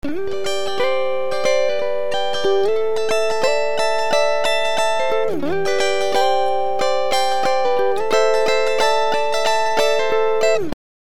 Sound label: Pitch Shift major sixth, Raised major sixth; Up major sixth